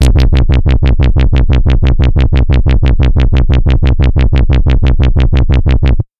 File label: Vibration Wobble Bass; molecules-and-light